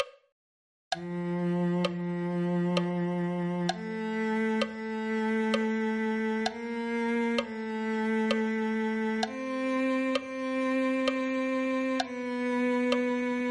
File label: cello